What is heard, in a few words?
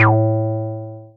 Synths BASS